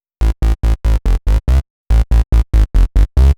Szeet Bass A, uk garage, 142bpm, Bass; Lines n Loops

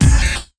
old skool stab

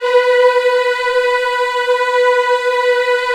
B3 WOMEN EH, Choir Acoustic & Synth; USB Soundscan vol.28; AKAI

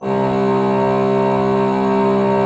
C2, Cathedral Organ; Propeller Island

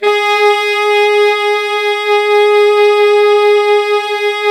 big band fast alto; Roland L-CDC-01

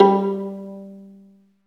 STR Violin, Solo Violin FX

